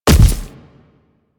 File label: Splitter Step Default, Footsteps